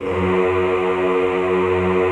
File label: EHH F#1, Classical Choir; Best Service